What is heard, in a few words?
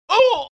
ouuuhh